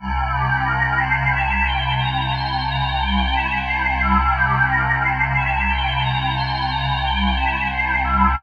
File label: Pad; Dream Zone